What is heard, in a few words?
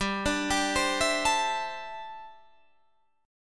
D 7th Suspended 2nd Suspended 4th, G